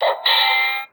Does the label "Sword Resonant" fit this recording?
Yes